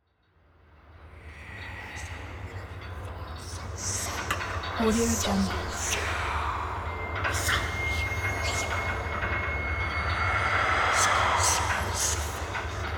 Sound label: horror ambience